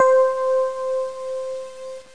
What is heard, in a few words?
Electric Piano; Metro 4.5; MetroSounds